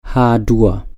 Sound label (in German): h-dur